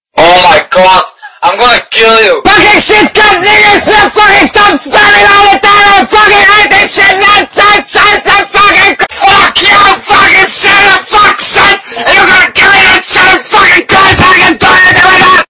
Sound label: rage; tw skullz; torchlight